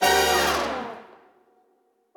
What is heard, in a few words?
Flashover